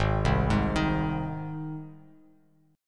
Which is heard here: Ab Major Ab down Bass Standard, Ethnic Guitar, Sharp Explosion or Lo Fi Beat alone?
Ab Major Ab down Bass Standard